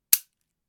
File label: Gun Trigger Pull